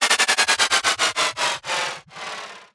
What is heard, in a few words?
Decelerating Sci-fi Rumble